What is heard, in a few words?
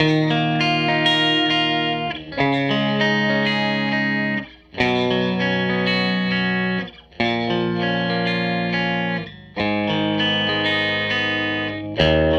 Strat Guitar Loop